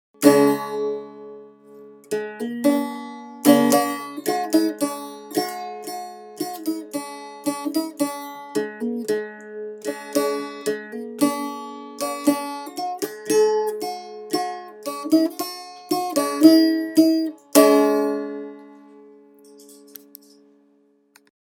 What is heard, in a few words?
dulcimer